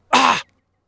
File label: Male Fight